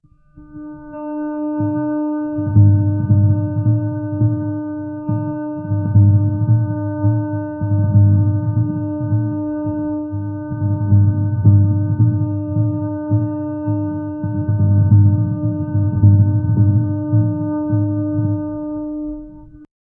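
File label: devotional instrumental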